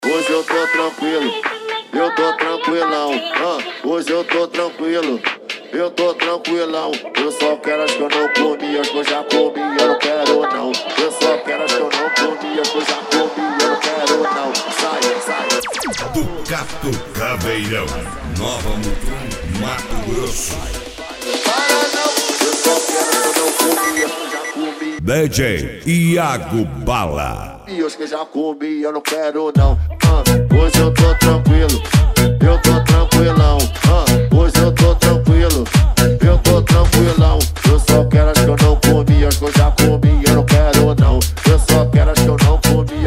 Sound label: especial mega funk